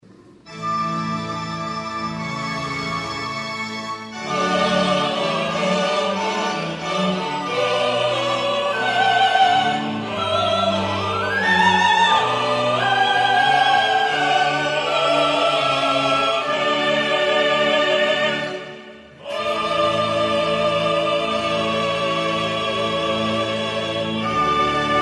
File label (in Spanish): amen; Amén - Haendel